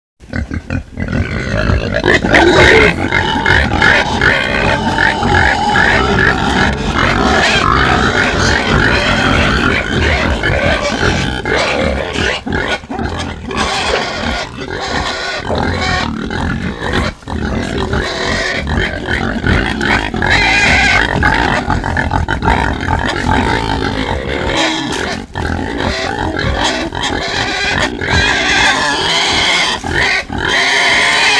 cochon

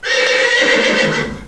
cheval

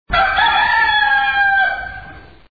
coq

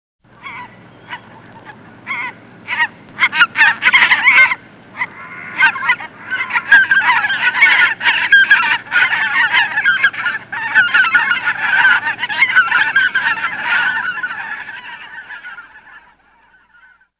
oies

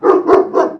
chien, animaux nature

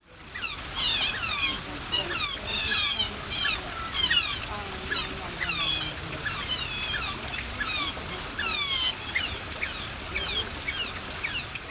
loutres

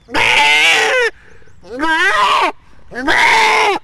chevre

